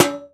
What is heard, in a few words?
Can hit, Foley; SFX; 7rystan's 600+ FREE EDM SAMPLES & DRUMS